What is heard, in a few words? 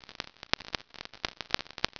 earthquakes, crackling; SimScience